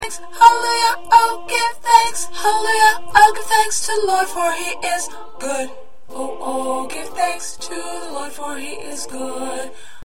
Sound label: 2nd Alto, choir parts